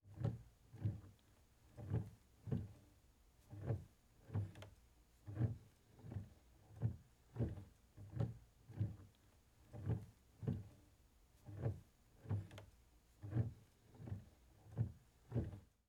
Wooden Rocking Chair on Wood; Fairlight Sound Lib

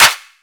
Ice Clap, Dubstep; One-Shots; Drums; GhostHack; Ghosthack - AC2024 - Day 13 - Dubstep Sounds 2025

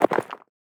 Inventory Material Stone UI; User Interface

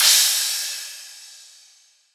Demonic Crash; Dubstep; Drum Shots; Rides & Crash; GhostHack; Ghosthack - Demolition - Free Dubstep Samples

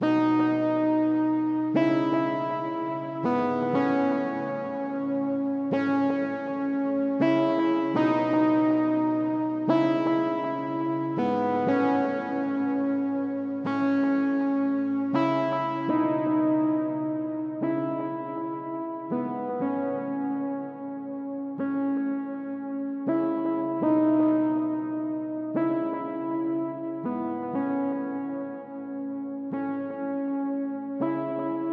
121 BPM C# Min Chords, Pop; Melodies; Loop Stems; Arena

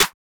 Snare - G, Hip Hop Drums - One Shots; Cymatics - 9God Hip Hop Sample Pack

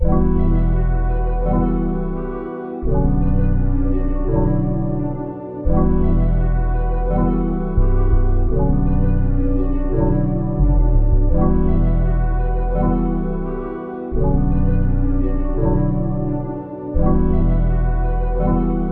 85 BPM B Min, Vintage & RnB Melodies; Potion; Cymatics

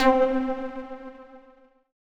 PLUCK (C); Melodic One Shots; Drink Up; Cymatics; GHOST (Beta Pack)

